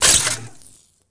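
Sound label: weapon fail; MOTS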